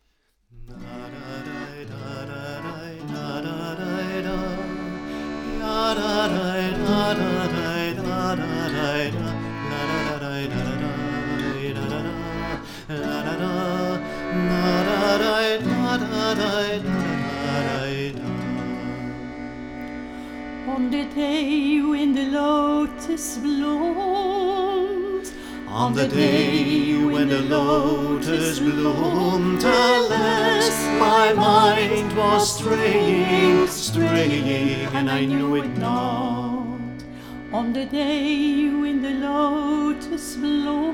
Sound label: music fragment; git